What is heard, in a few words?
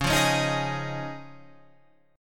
C sharp Major Add 9th, Guitar, Standard; C#add9 strummed; JGuitar